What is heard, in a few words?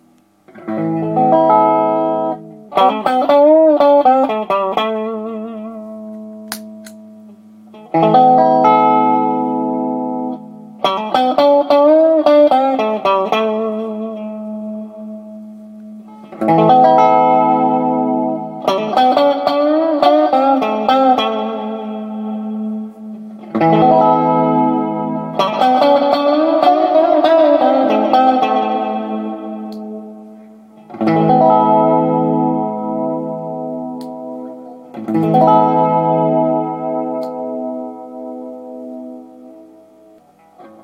reverb